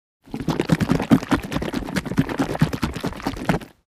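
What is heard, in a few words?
shaking, shaking liquid in a bottle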